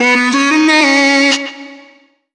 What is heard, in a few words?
Vocal Sample Pack Phrases B